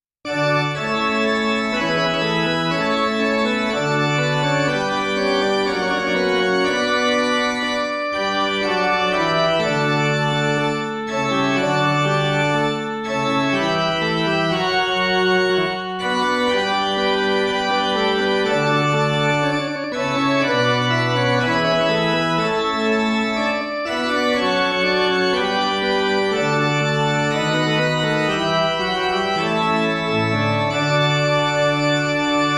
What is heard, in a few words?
midi